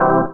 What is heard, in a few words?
HOUSE, House Dance